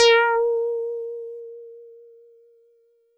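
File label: Mono Bass DX100 A#4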